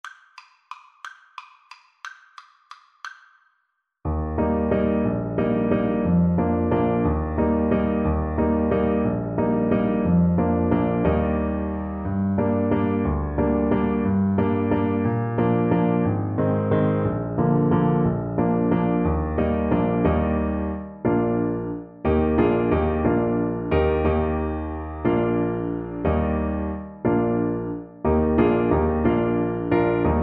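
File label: bring a torch, alto saxophone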